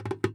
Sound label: JAZZ TRAP KIT